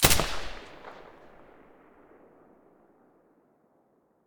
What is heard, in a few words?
silencer sa80 mono